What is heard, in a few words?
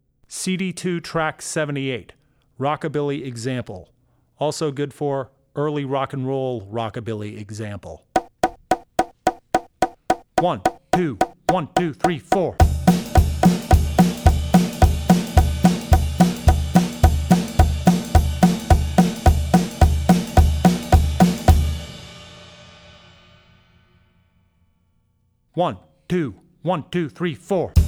Rock N' Roll - Rockabilly; Drummer's Bible Tracks